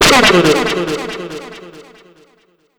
Airhorn

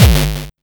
Kick Dist Badman Reese; Granular Sumo

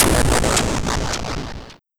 Noise Downlift

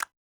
snap; foxdot default

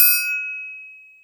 Bell Small Bell; Pyrex

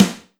Snare 80; Yellowtree

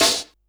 jungle snare

